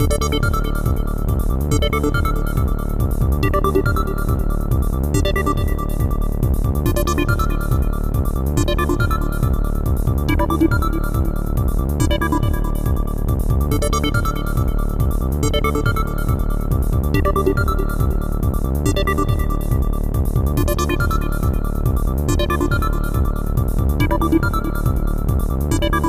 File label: electronic bed